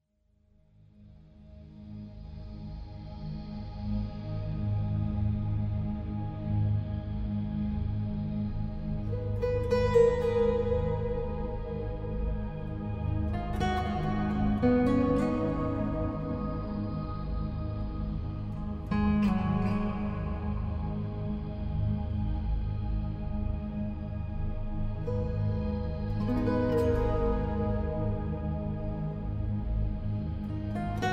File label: study music